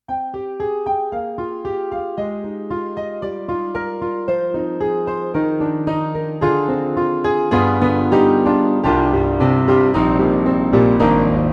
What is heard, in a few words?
mu100 piano